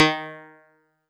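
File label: Old Banjo DX100 D sharp 3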